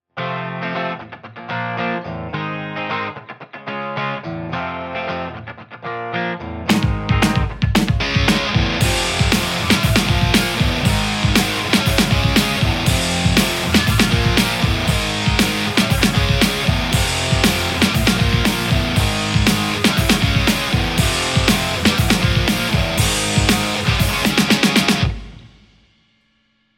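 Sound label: Telecaster; mercuriall harlequin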